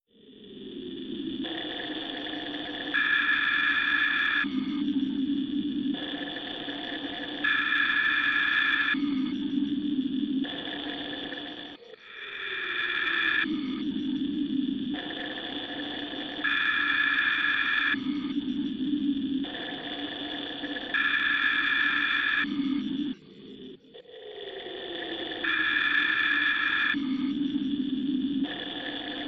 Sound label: Ambiant